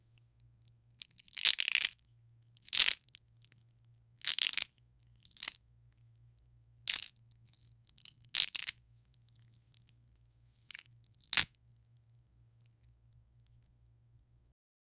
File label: biscuit wrapper crinkle